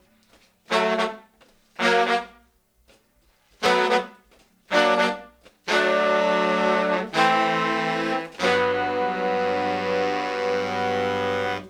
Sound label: Soul Horns, 70s Analog Funk